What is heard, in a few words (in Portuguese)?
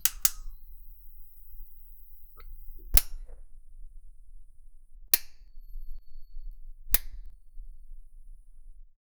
estalos ocasionais de lmp, electrical